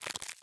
Eat

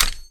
Grab Bat

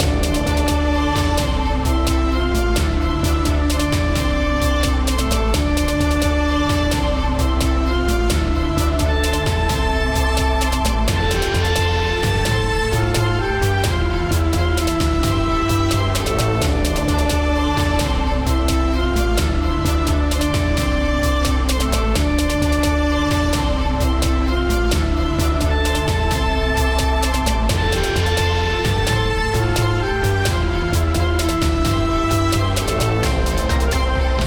tournament loop; Astro Boy Edge of Time

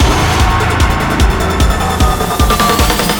Burning-Hot Beats

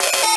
Fx Glitch; Fx Glitch Mayday

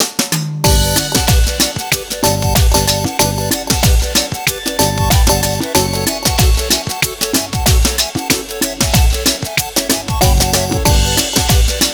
Bgm Stepup Result

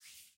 Hand Move

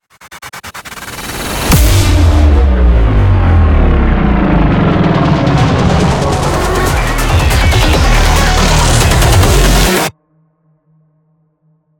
Boss Fill; Voidbreaker; ps5 windows xbox series xs; Boss 3 Fill 1

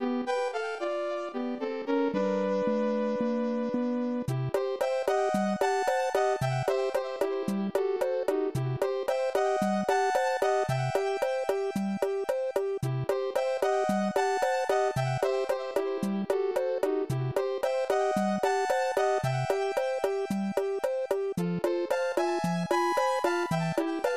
Underwater BGM; SMB3; Super Mario All-Stars; SNES

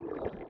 Hunger

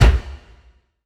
Floral Boost

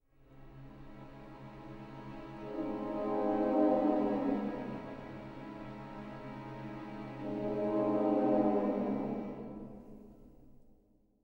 Explore Dungeon Stinger 100 Am

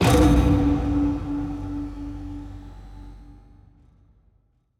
Spawn

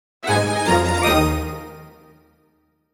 Obtained a Key Item!; Pokémon Legends Z-A; Switch 2

